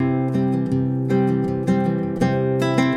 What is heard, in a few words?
SPANISH C, GUIT, 80BPM; Best Service; World Colours